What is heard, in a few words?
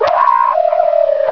SCREAM; ABUSE